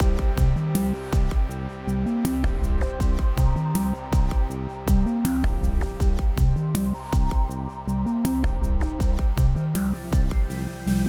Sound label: Exploration 8CH 80BPM